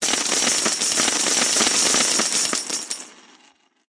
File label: music win money